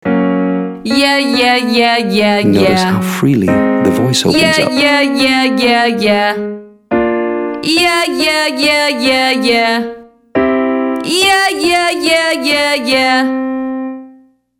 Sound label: Yeah Yeah Yeah female; Singing